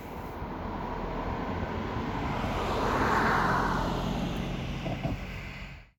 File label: Passing Car Road Bump